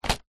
medicine lozenge pack set down table gently; household; strepsils

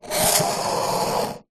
wood plank spike scratch; foley; zapsplat

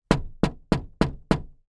household door knocks thin glass wood multiple; zapsplat

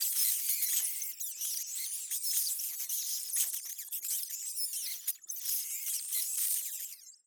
Rats Squeak; Blastwave FX

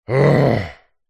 human male grumpy growl angry frustrated; zapsplat

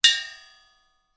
industrial gas cannister small hit ping

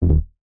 game tone error

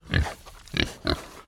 animal pig kunekune grunt snort sniff close by

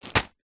foley plastic packet light drop on surface; zapsplat

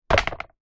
sport flipper fin drop light on ground

